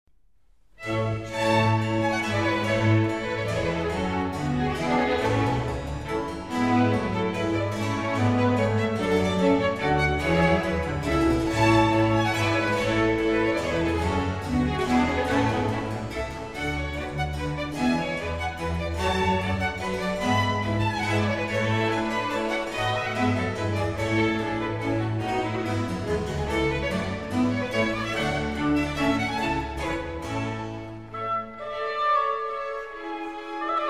Harpsichord Concerto No. 4 in A major Allegro ma non tan; (or for oboe d'amore) BWV 1055